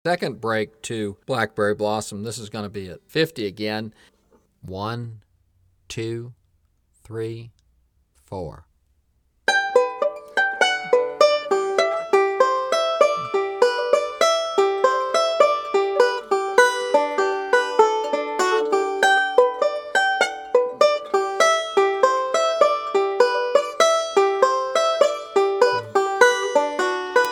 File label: Blackberry Blossum Break 1, 50 Bpm